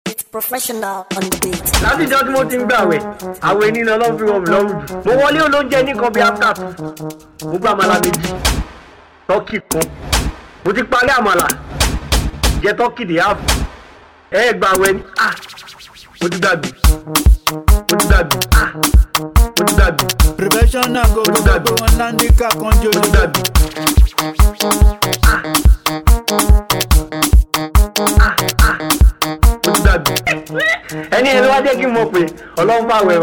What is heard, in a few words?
Professional Beat